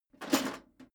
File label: metal plate armor movement single